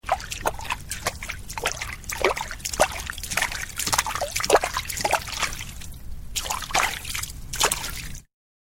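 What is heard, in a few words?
sounds of a person's footsteps in water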